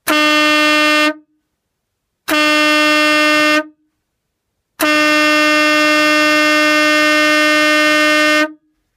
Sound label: truck, horns